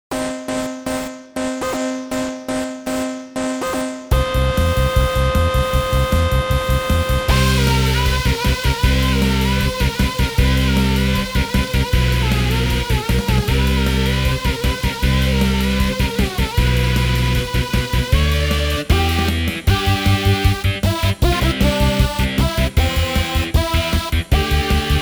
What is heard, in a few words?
motor sports, アップテンポ